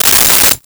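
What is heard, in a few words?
Ratchet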